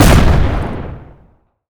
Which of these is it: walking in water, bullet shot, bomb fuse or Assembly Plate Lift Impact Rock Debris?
bullet shot